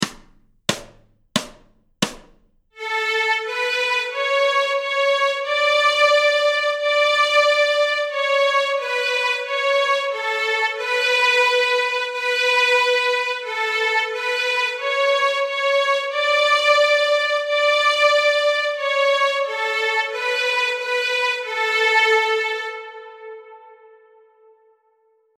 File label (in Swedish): Svärddans Stråk A, Fiol